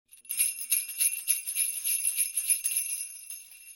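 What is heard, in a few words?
Winter box open; FlyForInsanity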